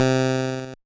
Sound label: Buzz; Bitkits